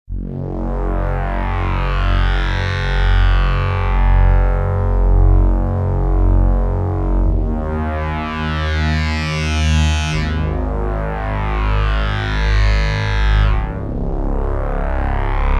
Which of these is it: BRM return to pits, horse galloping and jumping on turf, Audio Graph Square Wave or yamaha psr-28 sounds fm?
yamaha psr-28 sounds fm